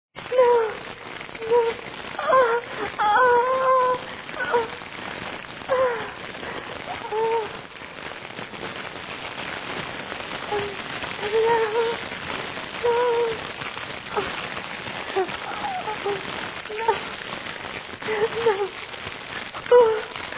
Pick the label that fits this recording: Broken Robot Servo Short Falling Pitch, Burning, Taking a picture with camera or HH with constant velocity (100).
Burning